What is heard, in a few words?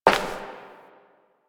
Step Glass; Warframe; Koumei